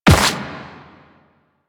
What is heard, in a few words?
Run Dirt, Footsteps